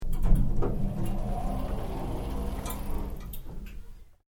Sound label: Lift Factory Old Door Open, Various lifts operating; Elevator; Elevators; Machines